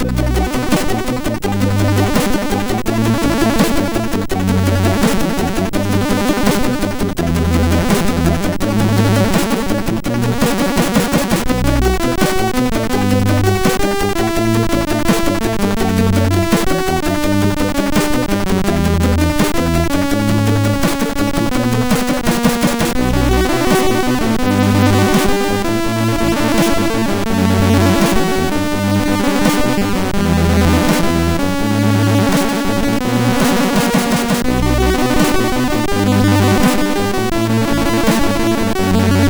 Intro Tune, sid MOS6581R4; Intro-Tune for Talent

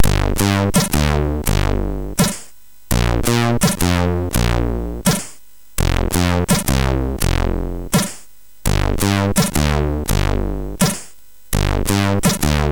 Bass, MOS6581; sid; MOS6581R3

